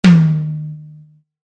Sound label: tom, drum kit